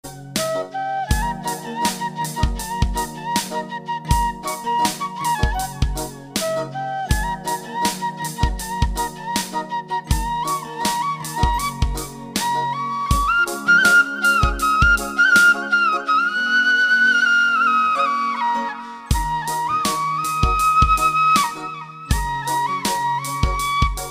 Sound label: flute; krishna status